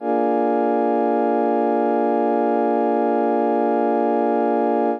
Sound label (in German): akkord b7 richtig